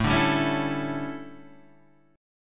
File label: D Major 7th Suspended 2nd Suspended 4th, chord